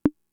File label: Conga, Fricke MFB-522